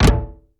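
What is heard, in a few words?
ethnic percussion; Crypton - DrumBase5000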